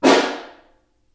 Far, Snare

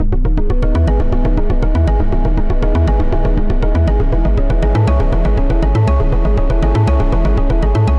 Topping Synth; The Forge by Hecq; Ableton; Factory Packs

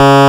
Sawtooth C#2, Glitch Toys & LoFi; Ableton Foundation FreePacks

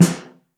Snare Mono

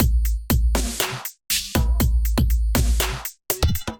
Kit, Drums, Hybrid; Powered Kit; Ableton; Factory Packs; Drum Essentials

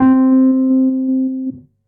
Wurly Blender, Wurly Piano; Electric Keyboards; Ableton; Factory Packs

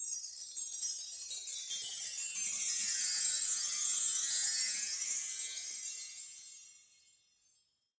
Chimes

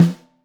Snare Top

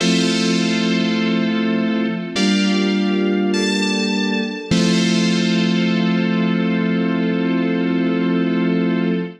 Keys F Major 102 bpm; Accompaniment; Tonal; MIDI Clips; Impaled Keys; Drive and Glow; Ableton; Factory Packs

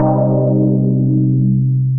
Tech Chord, Synth Keys; Wavetable; Ableton; Synth Essentials

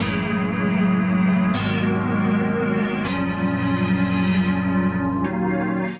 Chords Low G# Minor 160 bpm; MIDI Clips; Ableton; Factory Packs; Skitter and Step

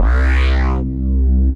Big Cat Snarl, Synth Bass; Ableton; Factory Packs; Beat Tools

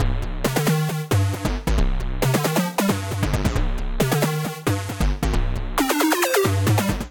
C Minor 135 bpm, Bassline; MIDI Clips; Tonal; Field Marshall; Skitter and Step; Ableton Factory Packs

